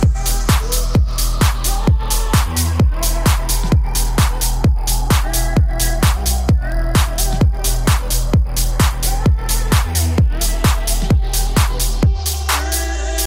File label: transition ringtone, english ringtones